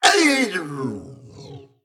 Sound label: Mech Emote Death; SaloonBartender